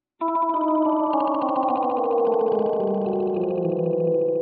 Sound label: music home transition downward